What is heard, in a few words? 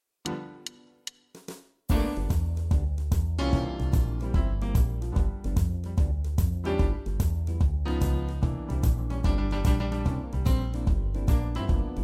Blue notes, Quartet